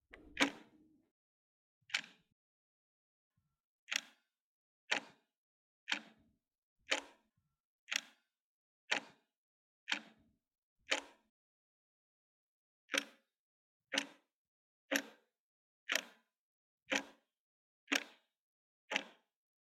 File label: Ticking Clock Analog